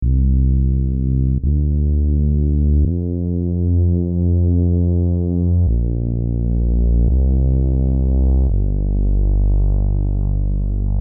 Rap beat

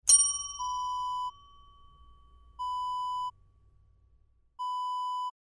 desk bell

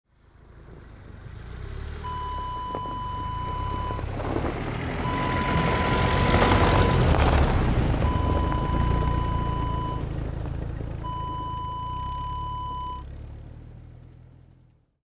car turn snow

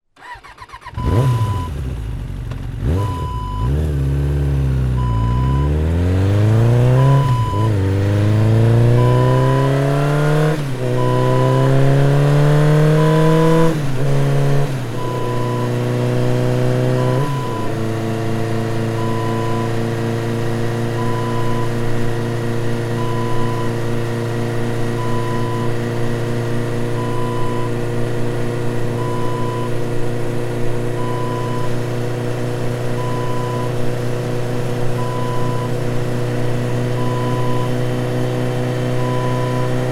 motorcycle ride

